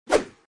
Sfx Enemy Lancer Attack

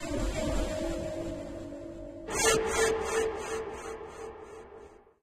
atmo

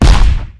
Bomb Explode Sound; Jolly Battle; Android

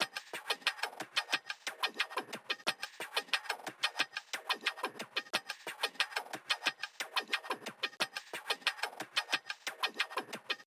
Noise loop 4 Bars; Vostok Inc.

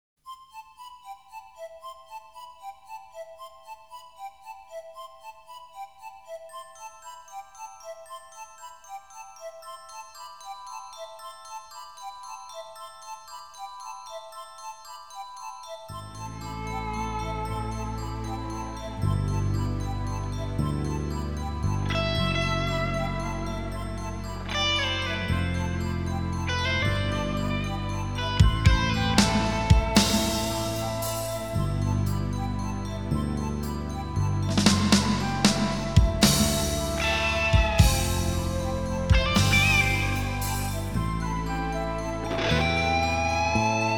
Harp; Falcom Perfect Sound Catalog II

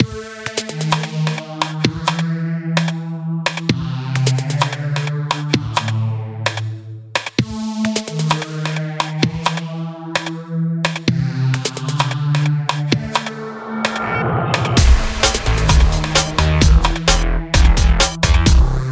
Menu Theme; Hovership Havoc; Windows Xbox One; Second Cycle Bounce

